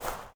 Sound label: Grass; blastmorph pinball; xbox series xs